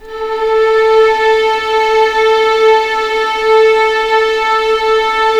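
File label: A4 legato mf violins R, East West The Ultimate String Collection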